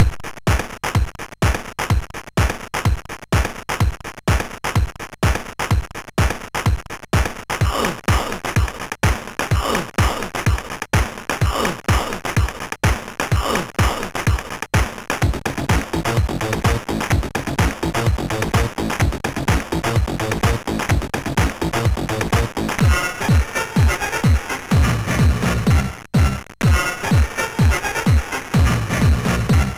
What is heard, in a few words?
Digi, sid MOS6581R4